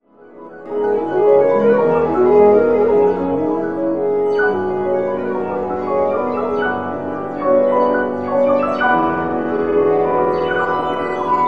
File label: tone